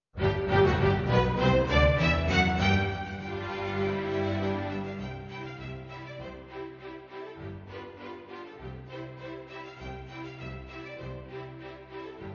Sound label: Polka